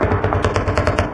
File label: city battle; Garry's Mod; hl2; streetwar